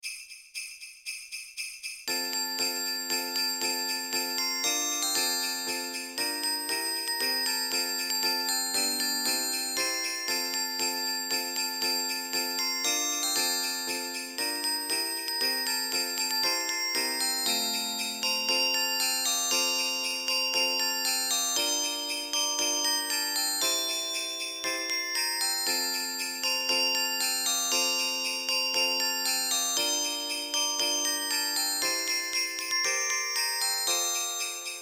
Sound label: Jingle Bells